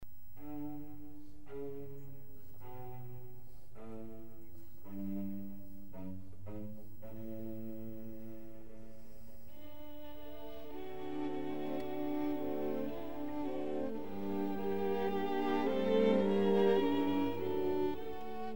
String Quartet in F minor

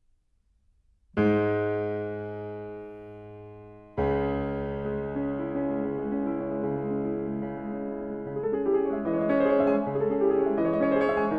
Impromptu No. 4 in C sharp minor; Chopin

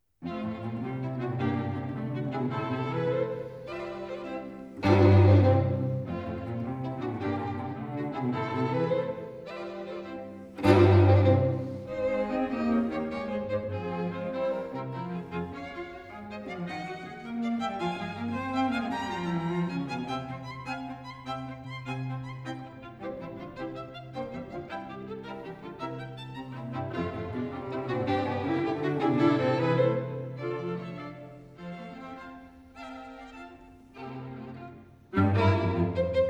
String Quartet in F Major Scherzo Allegro; String Quartet No. 1; Op. 18 No. 1; Allegro molto; Ludwig van Beethoven; Beethoven - String Quartets Nos. 1-16

